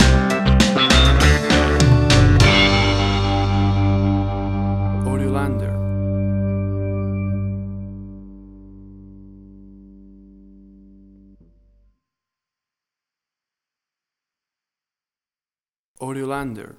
Carribean Reggae Music Stinger

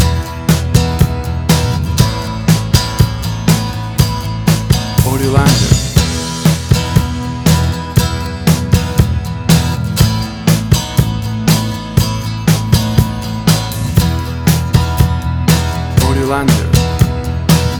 Bold and Big Indie

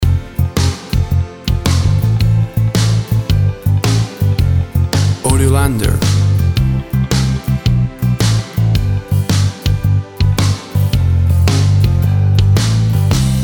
Upbeat Party Piano Soft Rock

